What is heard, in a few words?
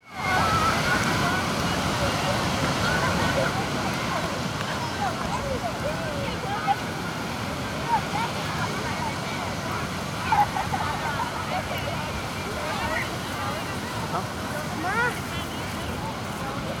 Beach Ambience Surf People Playing; Sound Ideas